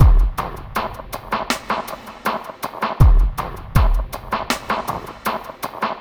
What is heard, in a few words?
Cellardoor, ambient; ambient vol 2